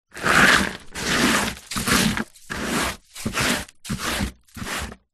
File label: foley dirt brush hand off plastic box; zapsplat